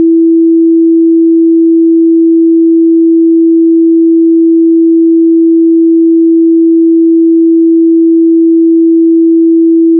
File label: sound color Button